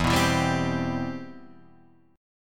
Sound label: C Major 7th, E, guitar chord